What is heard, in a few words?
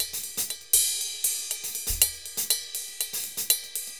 RIDE, MERENGUE; Best Service ProSamples vol.14; AKAI